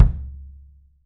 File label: KICK, 3D Drums; AXIS KICK